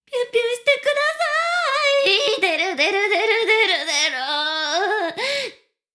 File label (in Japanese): しこしこ音声; 少尉; 牧場戦腺